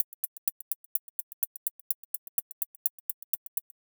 minimal house clicky hi hat loop 126bpm; 2 bars

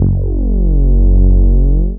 deep reese bass one shot f

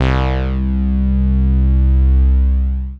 vintage synth bass hit key f; 3 seconds

